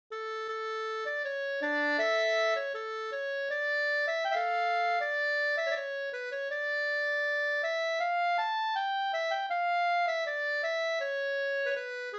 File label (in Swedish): brudmarsch; e Maklin e Blecka Anders